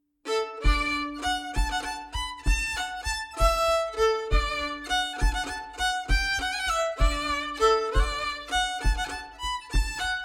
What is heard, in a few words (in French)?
Bourree d'Auvergne; Bourrees et autres danses antiques publiees par Elie Dupeyrat